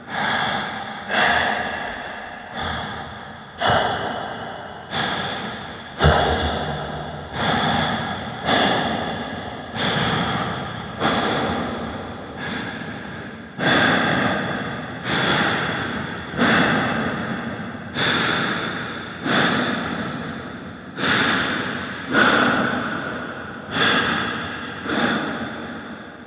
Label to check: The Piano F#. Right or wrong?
Wrong